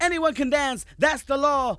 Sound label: VOC